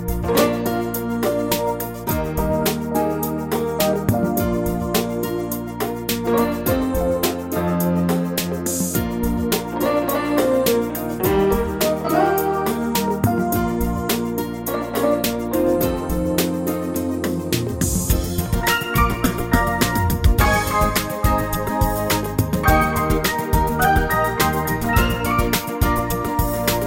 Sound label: Modern Ballad